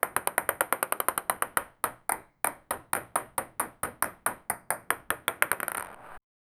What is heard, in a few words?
ping pong arcade